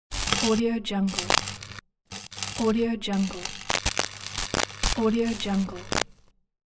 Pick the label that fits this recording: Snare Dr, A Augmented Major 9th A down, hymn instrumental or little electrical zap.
little electrical zap